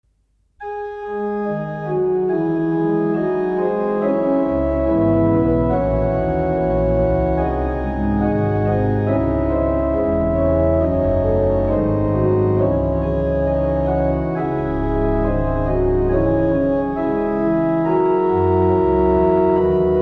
Das Orgelbuchlein Glaubenslieder Vater unser im Himmeirei, Bach Organ; Das Orgelbuchlein, BWV599-643